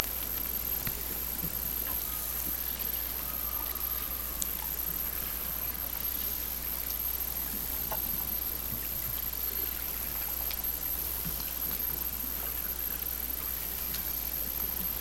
Hydrophones, orcasound

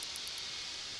Background Noise

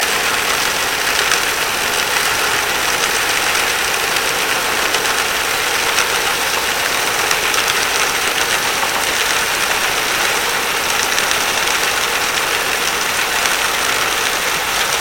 Hydrophones, orcasound

